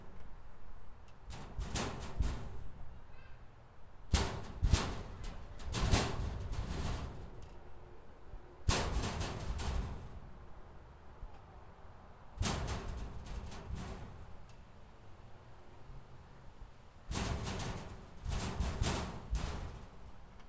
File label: Ratteling metal shelves